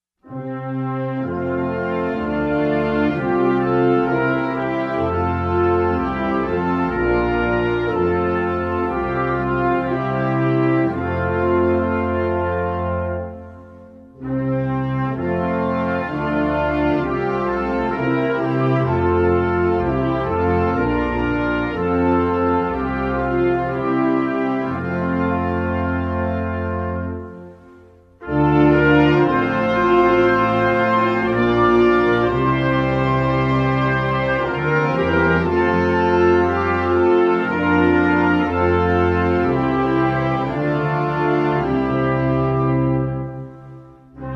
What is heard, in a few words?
Navy Band; United States; Het Wilhelmus